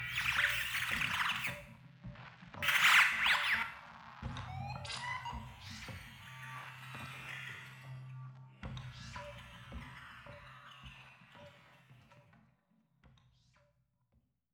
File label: splash death; gonner linux macos ps4 switch windows xbox one gamerip 2016